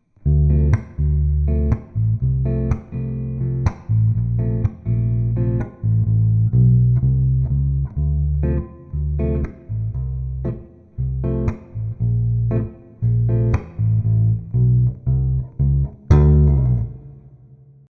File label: vgs soulmaster vsm-120 clean neck; select sbm